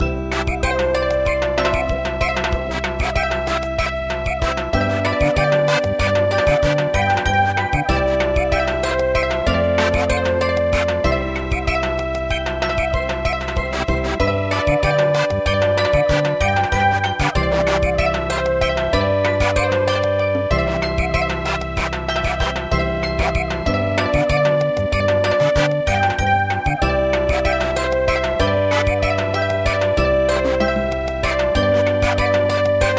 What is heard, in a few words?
Yemen Song Samba, midi traditional